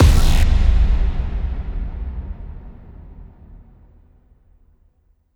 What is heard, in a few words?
Impact